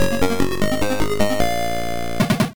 Level Start; Alien 3; Master System

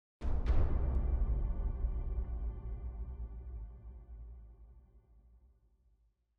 Big Drums; Citdel

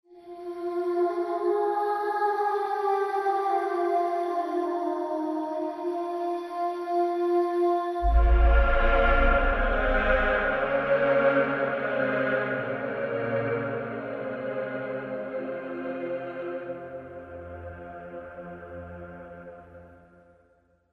Death; The Chronicles of Spellborn

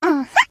Pink Activate; Angry Birds

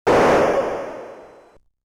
Player Tank - Shot

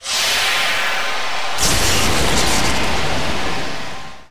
Warp; Wario Land: Shake It!

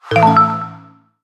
Menu Achievement Completed Note; aqua moto racing utopia ps4 switch wii u windows xbox one gamerip 2016

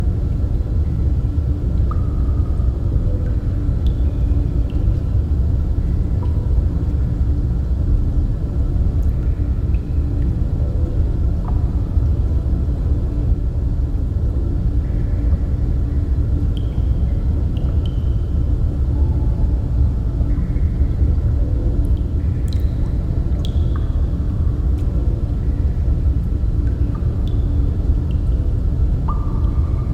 ambience water; brainiac attack; LEGO Batman 3 Beyond Gotham

